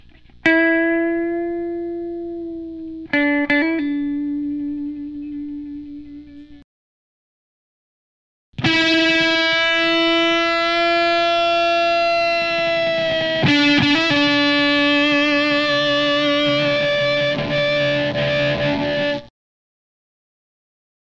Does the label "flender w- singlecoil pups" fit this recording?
Yes